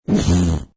Cartoon Fart Airy, Heavy expulsion with plenty of air; Comedy/Cartoon; Funny Sounds